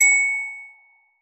sony glockenspiel messenger ringtone

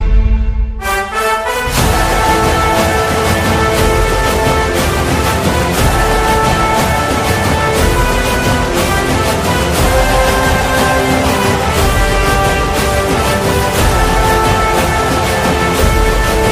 english background music ringtone

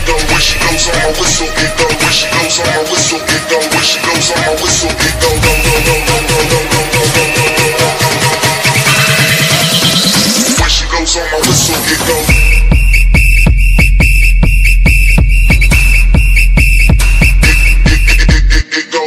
cool music ringtone

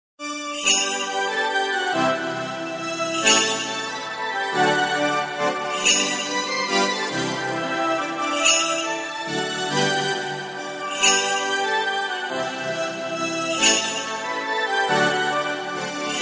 christmas ringtone; sony

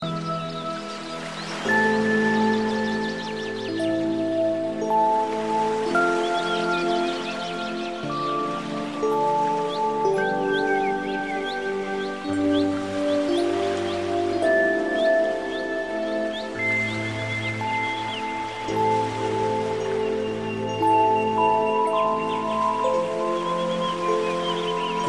beautiful ring tone ringtone

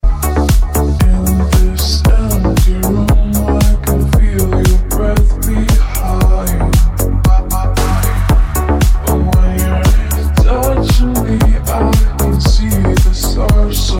deep disco classic ringtone

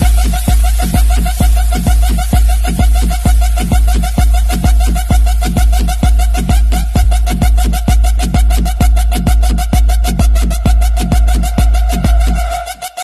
electro spin ringtone

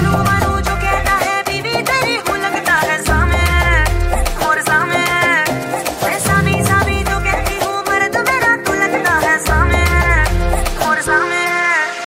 remix ringtone

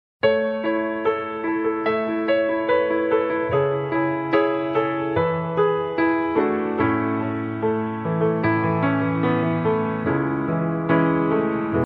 sad piano ringtone

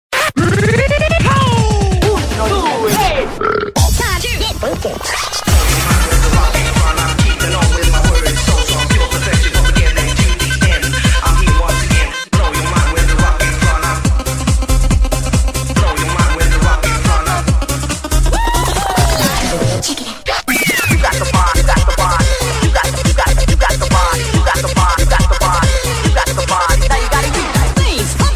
nokia dj mix ringtone

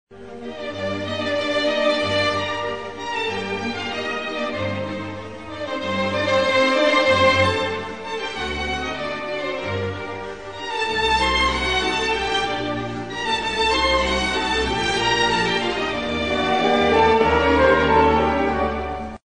classical europe ringtone; samsung

